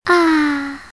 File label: Ahhh